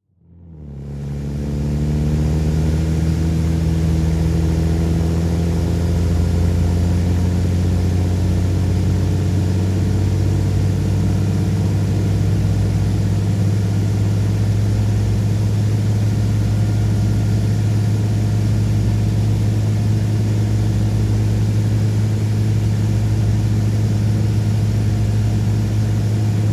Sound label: Tank moving interior perspective